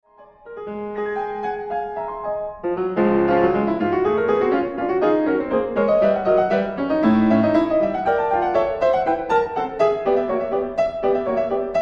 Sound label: Preludes for piano Allegro